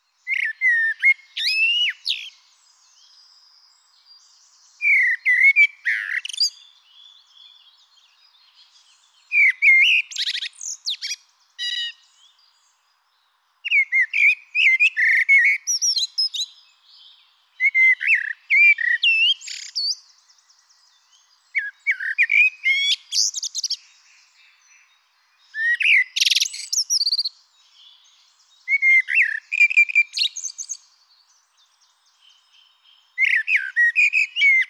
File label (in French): Merle noir chant; naturophonia; sonotheque